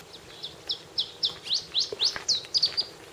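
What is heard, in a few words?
Iberian Chiff full song with trill; Phylloscopus ibericus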